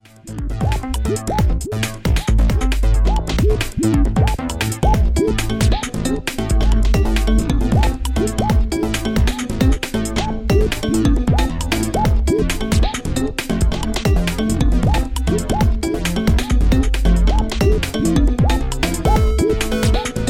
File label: Electronic tension; MIUI12